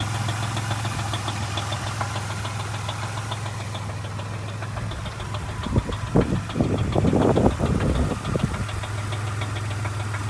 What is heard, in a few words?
engine knocking